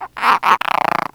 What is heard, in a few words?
grub die, antlion grub; npc; Half Life 2; Episode 2